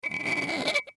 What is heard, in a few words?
Spigot Turn Rusty, Spigot Turn Rusty Metal Squeak; Squeaks; Household; Blastwave